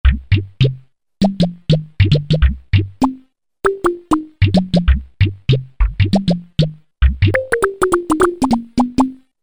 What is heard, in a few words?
Video Works, Korg MSC-1S; Korg MSC-1S Variety